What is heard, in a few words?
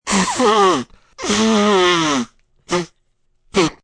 Blowing nose, long, cold, sick, (human voice); VoiceBox